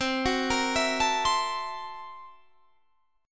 Fsharp 7th Sharp 9th Flat 5th, guitar chord

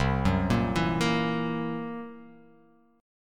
C 7th Flat 5th, C, down

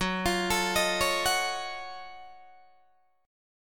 Gb Minor Major 13th Gb down Guitar DADGAD; arpeggiated

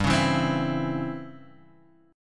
Db Minor Major 7th Flat 5th G

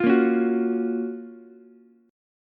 F sharp Major 7th, Ukulele; strummed; JGuitar

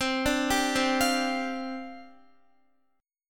C Suspended 2nd Suspended 4th, down, Guitar

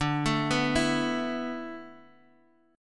E 7th Suspended 4th

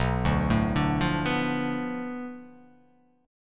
B Minor 7th Flat 5th B, chord; Bm7b5; arpeggiated; JGuitar

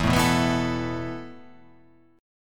E Suspended 4th E, down

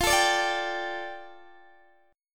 F 6th Add 9th F down Guitar DADGAD, chord

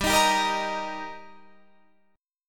G Minor Sharp 5th G, Guitar, Standard; strummed; JGuitar

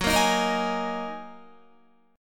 Gb 6th Add 9th Gb down Guitar

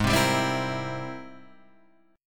G sharp Augmented Major 9th G sharp, down; strummed; JGuitar

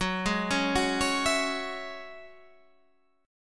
F sharp 7th Suspended 2nd, Guitar, Half Step Down

